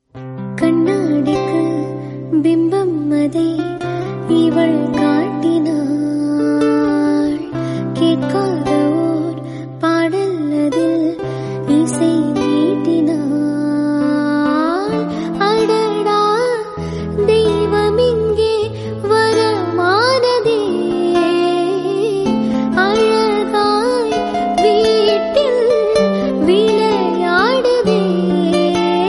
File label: Daughter Birthday Song Telugu Song